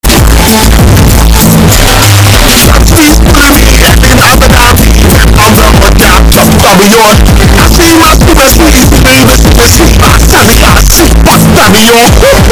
zingt Turks earrape

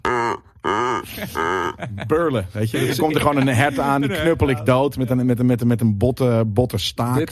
burlen

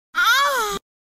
ahh lekker kreun

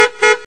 kermis toeter

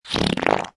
lekkere scheet

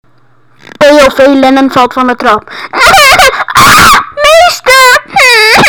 huilen

